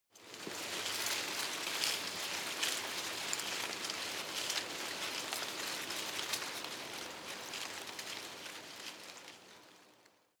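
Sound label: tree small leaves rustle soft, garten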